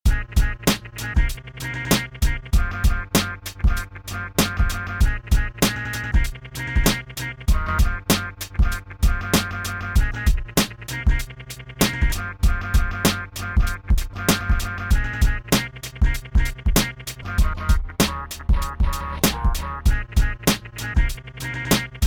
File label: Flyby loop; Master Munic